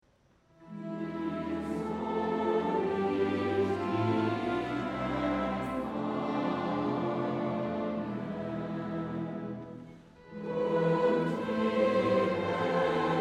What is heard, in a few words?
Choral